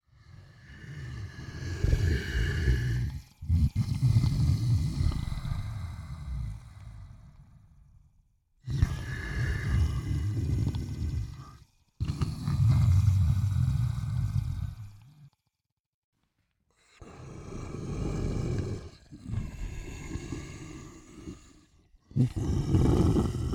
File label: snore behind curtain loop